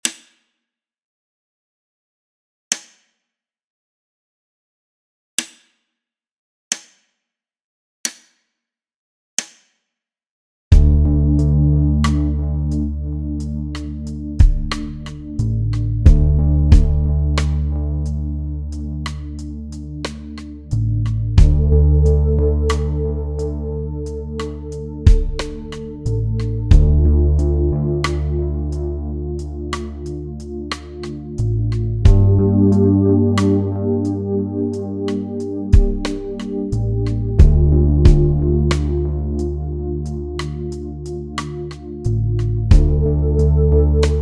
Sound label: 4:4 bpm45 Backing track